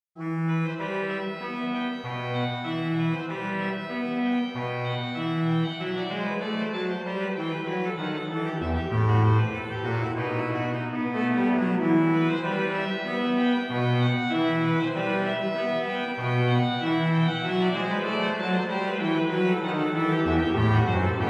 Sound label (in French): Gloria Grand Choeur Rondeau, Organ Music; BeauvarletCharpentier; Souvigny